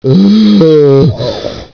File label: zombie run; zombie plague